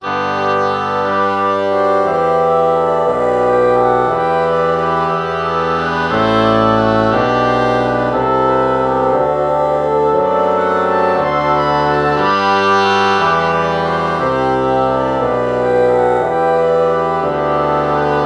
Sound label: oboe bassoon bach morph